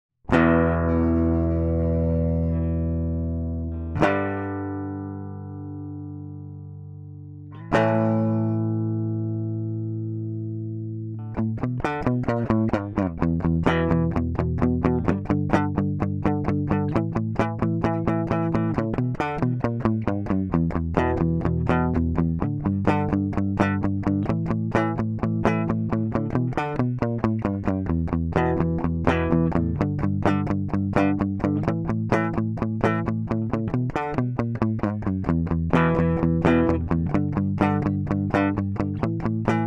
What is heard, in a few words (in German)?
mercuriall spark rhythm 1 ohne plugin